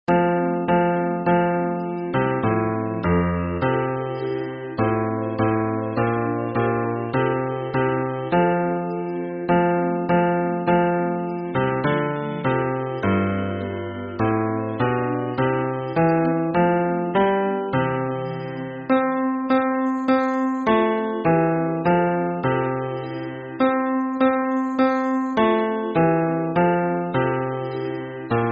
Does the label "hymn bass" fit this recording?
Yes